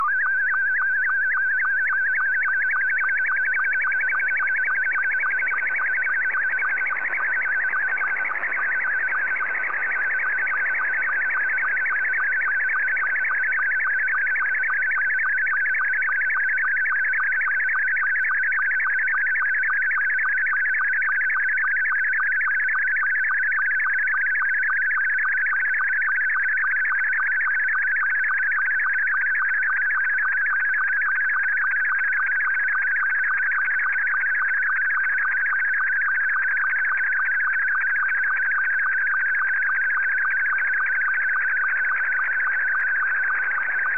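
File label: Scottie 1, slow-scan television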